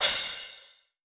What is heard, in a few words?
crash; osu